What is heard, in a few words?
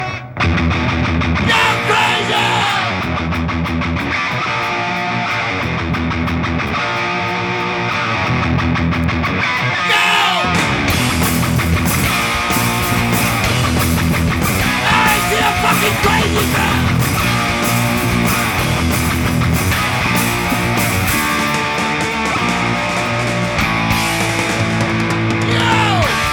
Riffs, Rockwave Festival; Athens, GREECE, 2005-06-25